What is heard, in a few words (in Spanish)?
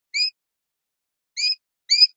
pirreos, campero